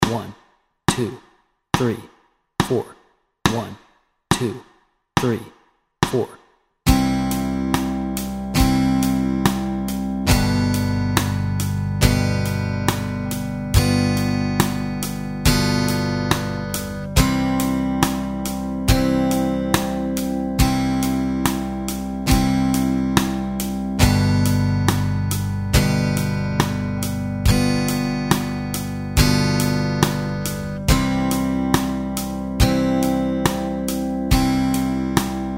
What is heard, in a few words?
Hush Little Baby Chords Track, guitar